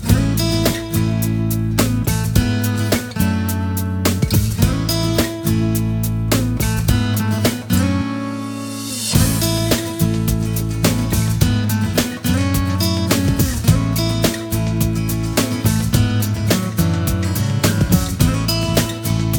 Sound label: entertainment string